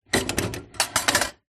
household popcorn maker machine metal kettle flip turn to empty